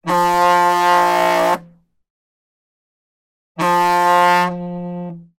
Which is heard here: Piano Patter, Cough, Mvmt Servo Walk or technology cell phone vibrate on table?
technology cell phone vibrate on table